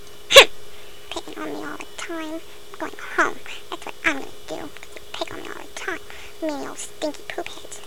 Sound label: hmph pout